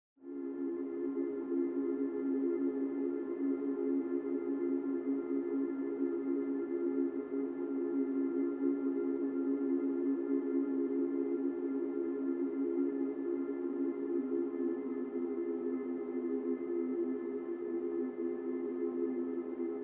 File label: Calming waves en Db; orchestral